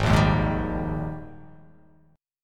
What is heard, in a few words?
Ab Major 7th Suspended 2nd Ab down; strummed; JGuitar